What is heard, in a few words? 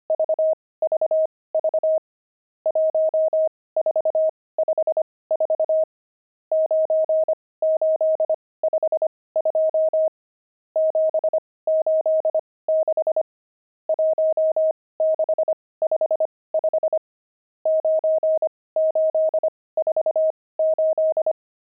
pangram, intermediate pangrams files